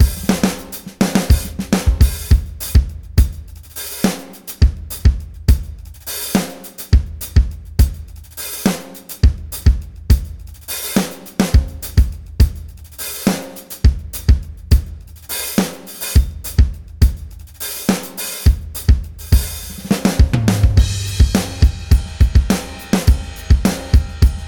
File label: Moderate Rock; The Rock Warehouse SDX